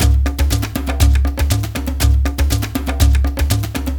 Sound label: DJMB DNC, Heart of Africa; Spectrasonics; Heart of Africa 2